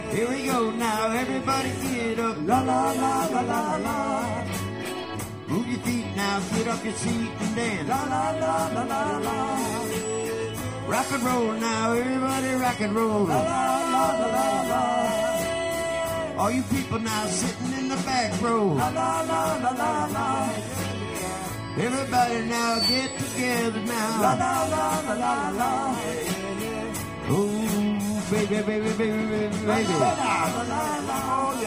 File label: Sound Check in A Drums Mic Bass Guitars